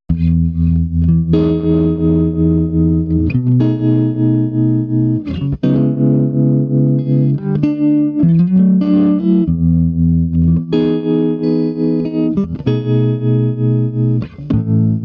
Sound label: Swart Amp